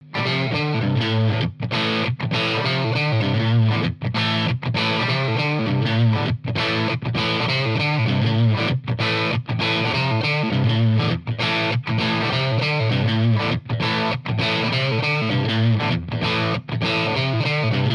Strat boost

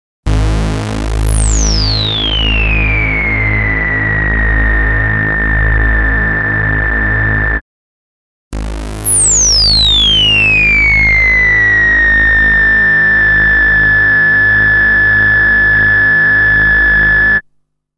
minimax filter reso

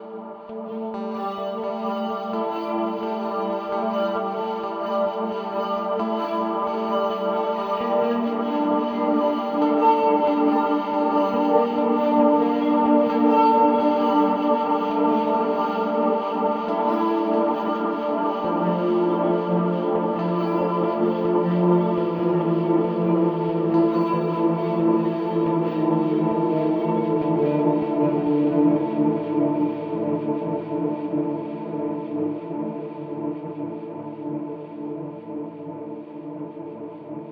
Mooer R7 X2 Shake

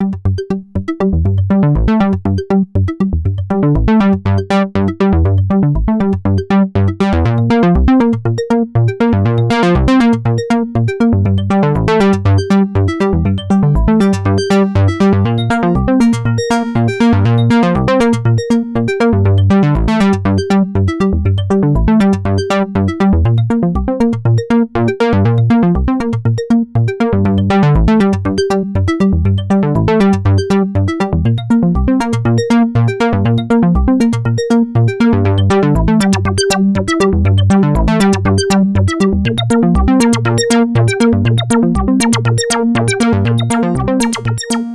ambika arp

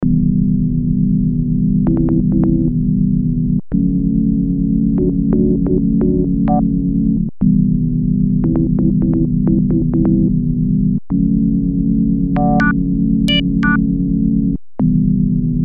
SH01a Moody Pad Detuned

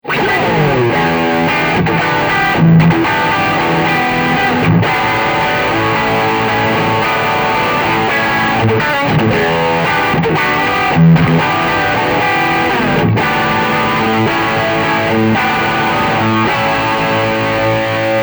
Crunch

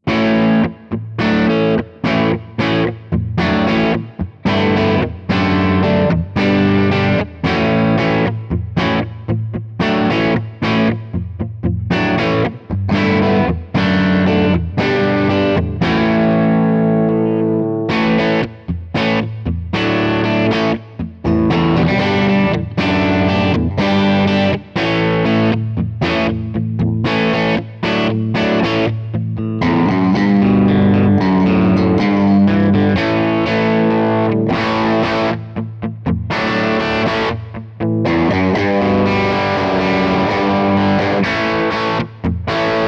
Les Paul Crunch; Slash Signature

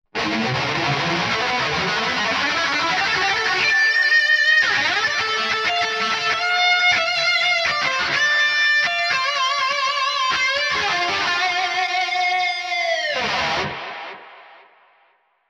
Mesa Boogie Solo 212; Neural DSP

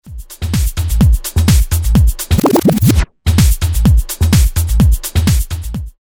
Backspin

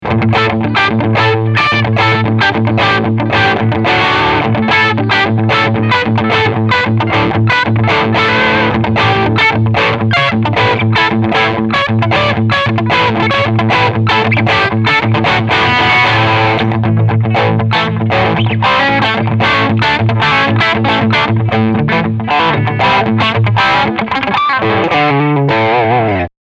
CR Crunchsound

